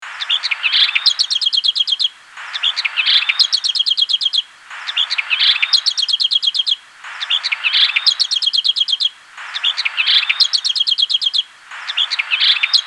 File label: lesser whitethroat